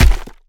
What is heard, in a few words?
head hit; finalmother; isaacs